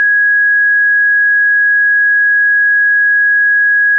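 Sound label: Clean Sine DX100 G#2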